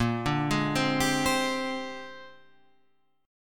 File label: A sharp 13th A sharp 6,5,6,5,8,8 down Guitar Standard; arpeggiated; JGuitar